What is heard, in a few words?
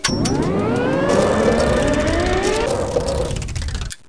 End Tape